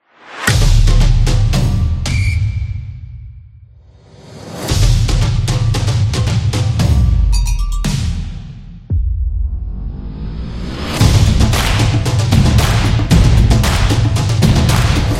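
Soccer Football, Street percussion background music built for sport, motion, and dance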